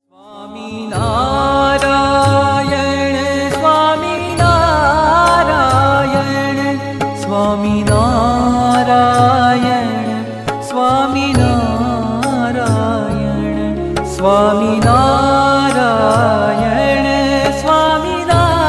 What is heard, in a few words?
SWAMINARAYAN DHUN